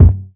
classic hip hop kick; Classic Hip Hop Mega Kit

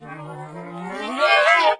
compression artifact gliss; Electro and Synthetic

